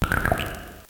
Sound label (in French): Cri